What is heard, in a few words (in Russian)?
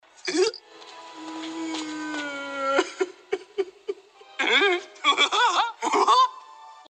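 smeshok, giggle; pennywise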